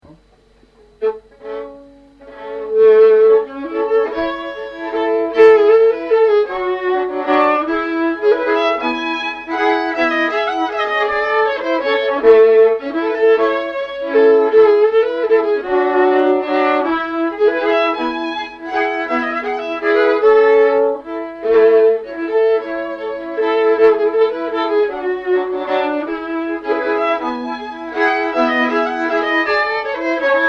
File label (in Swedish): Vals